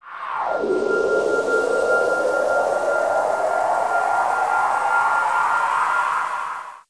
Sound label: Wind Cast; Casting Farore's Wind